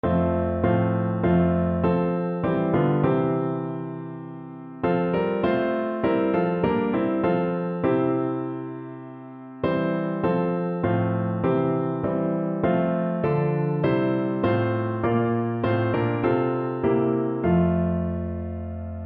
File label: love the lord, piano